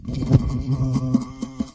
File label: Electro FX; Ueb HE Electro FX